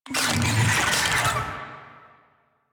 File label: Infested Big Ashen Vocal Attack Death; Warframe